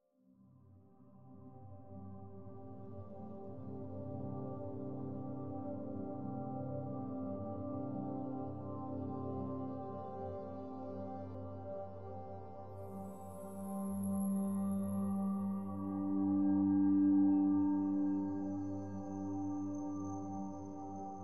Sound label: Whale Cave; Never Alone